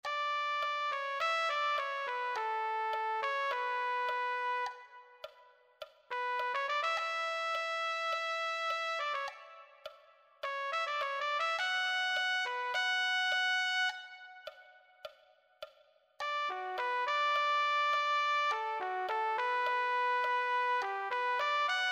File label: trumpet